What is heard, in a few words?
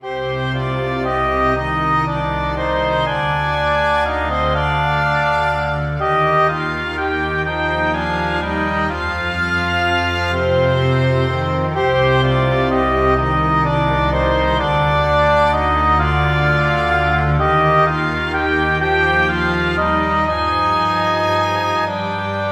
Bad News; ferda